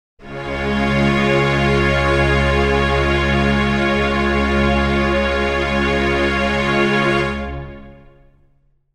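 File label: string chord c sharp major, Pads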